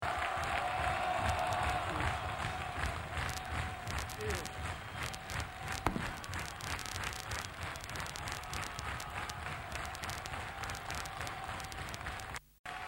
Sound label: clicks